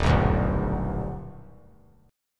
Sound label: Eb Augmented Major 7th Eb down; Eb+M7 strummed; JGuitar